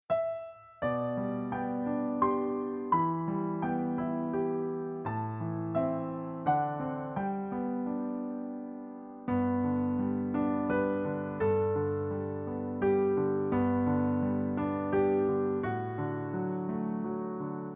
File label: rock a bye baby instr, Children's Music